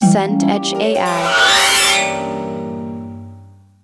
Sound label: harp gliss up